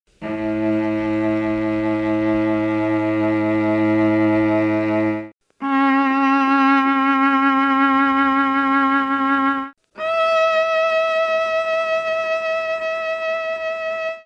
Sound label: viola, mellotron